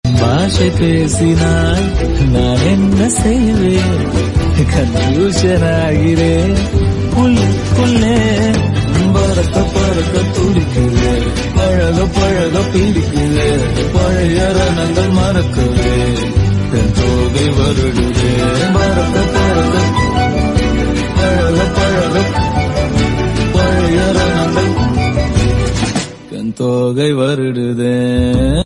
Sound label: Tamil Movie Ringtone; Thiruchitrambalam